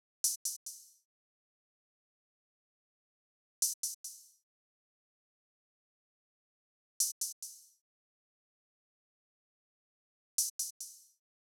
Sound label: Drum Loop - 142 BPM Open Hat, Drill; Loop Stems; Cymatics; PARADOX II - Drum Loop Collection; Yearbook